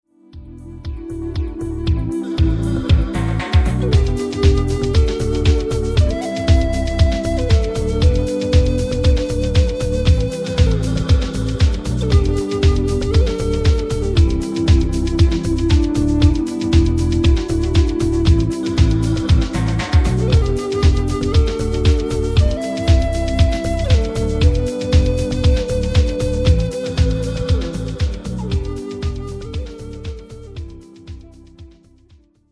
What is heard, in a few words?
Native Trance